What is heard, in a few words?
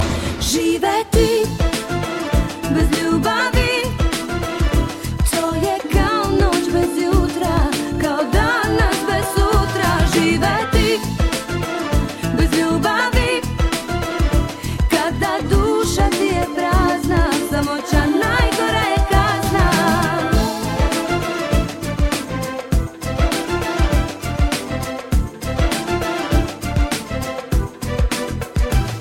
Funky